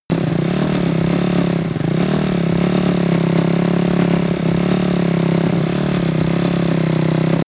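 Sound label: snowmobile engine unsteady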